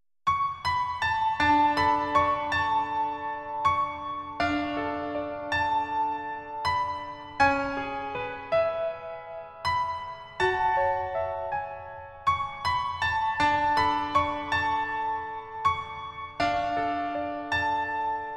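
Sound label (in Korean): Piano version 멜로디MR 남자키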